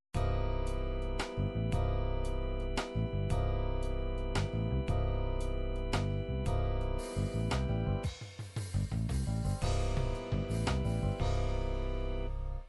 gospel waltz, midi

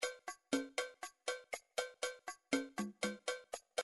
drums latin salsa, midi drums

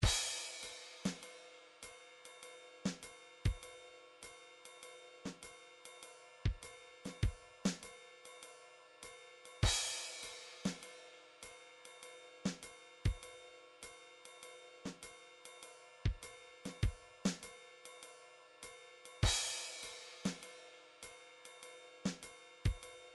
midi drums swing

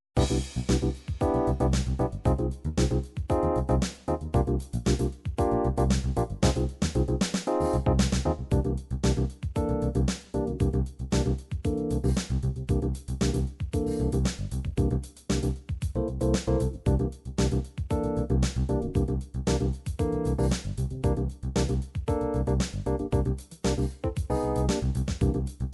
dance funk, midi

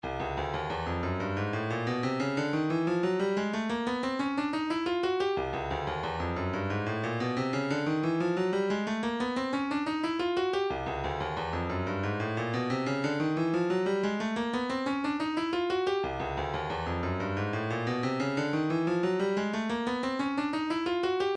wavedrum, midi

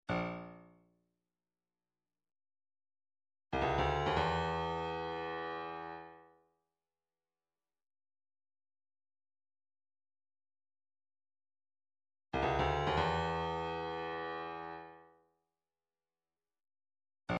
109 agogo

